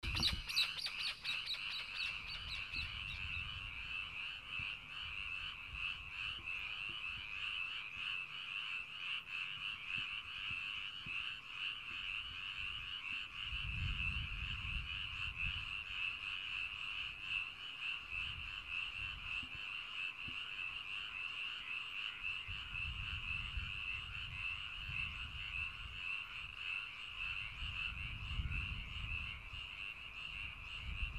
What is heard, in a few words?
Some More Birds Kakopetria Cyprus